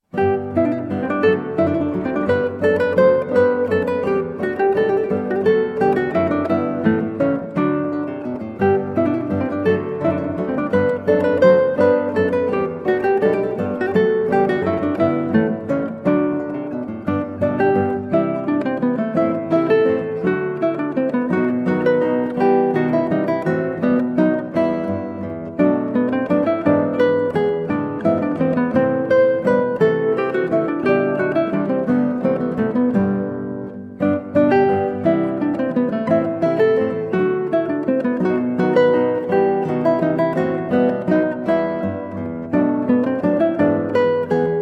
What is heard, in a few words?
Menuetto, Guitar; Flute Sonata in G Major HWV 363b; Handel